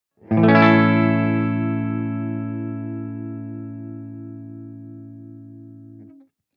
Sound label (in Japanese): クリーンギター